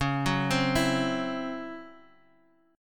C sharp Minor Major 7th Double Flat 5th Guitar Half Step Down